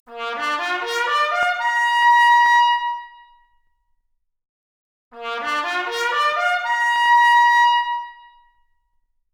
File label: Trumpet arp, Stereo output noised; 3bit